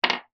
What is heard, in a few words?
technology headphone jack adaptor put down on table